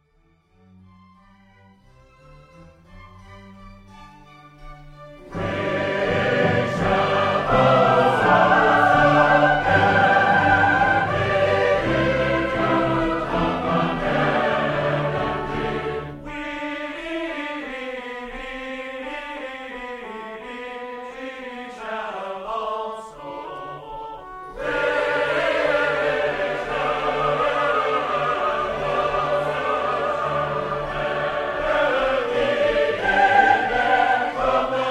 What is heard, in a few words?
trumpets cantata